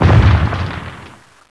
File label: elephant hit; Caesar III